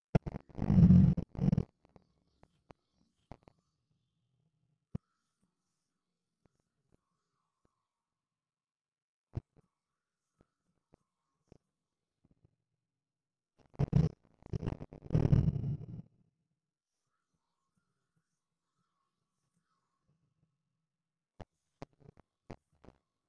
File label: guitar